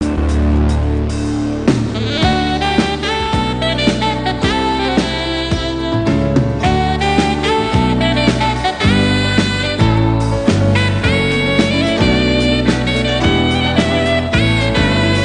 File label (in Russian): саксофон; Альф